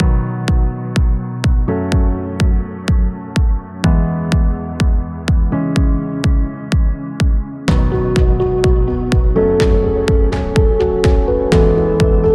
Corporation

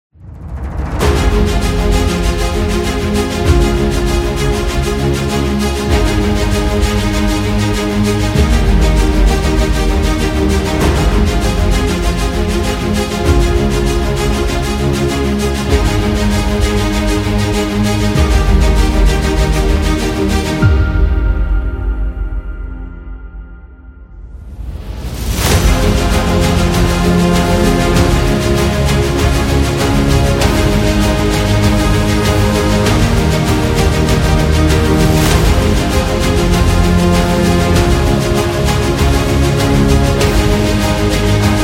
Epic Background

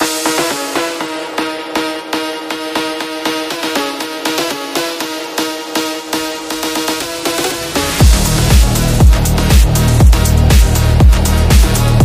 Phonk background music; karma

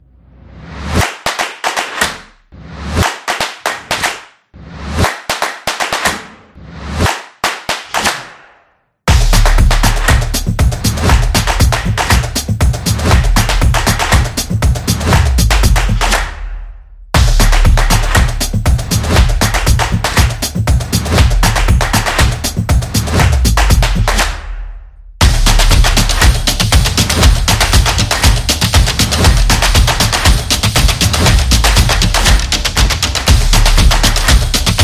Online Learning, the drum beat

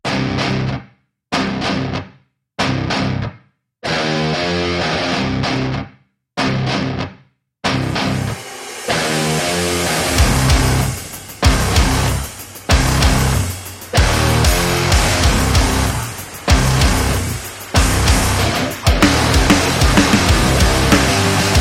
Aggressive Alternative Metal; action metal and rock music

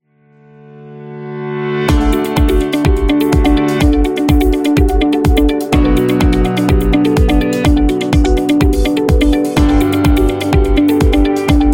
Tech Corporate

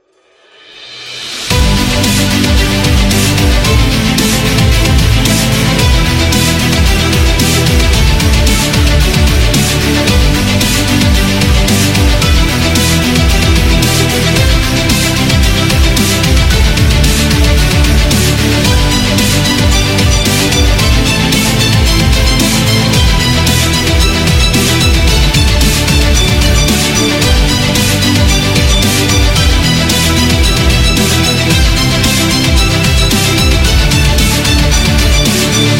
Epic Win

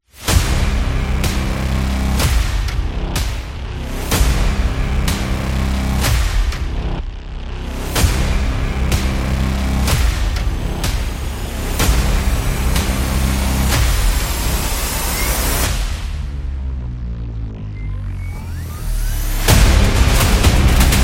Epic Trailer; battle drum

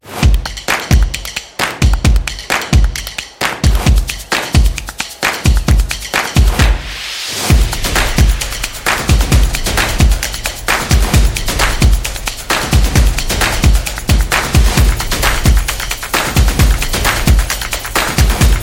Drums and Sport Percussion; action drums percussion

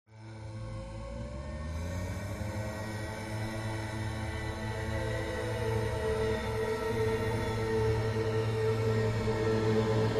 Horror Trailer

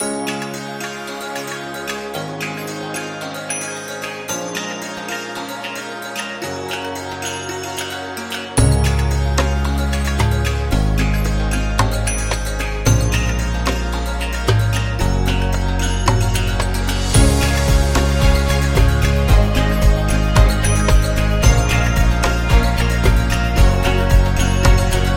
India Loop

